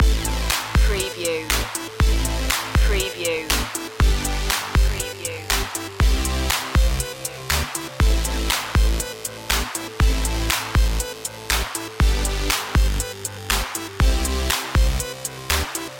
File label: electro chip loop